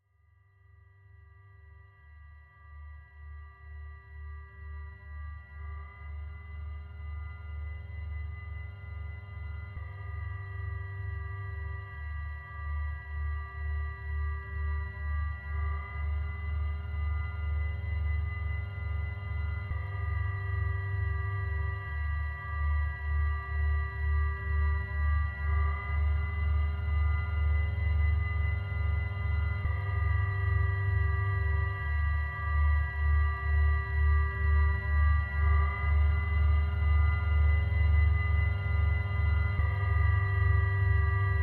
String Loop Short Frm Ends With Child Tune Full Song